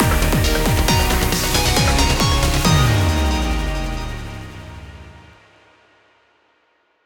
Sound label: Battle Win; Pokémon Trading Card Game Live